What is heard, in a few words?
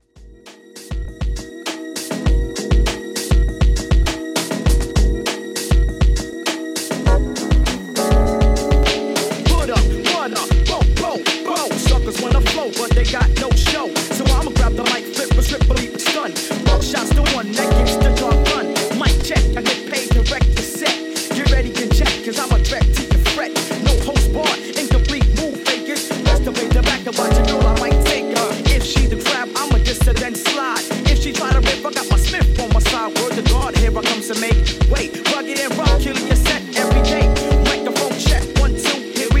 Classic Redrum 100Bpm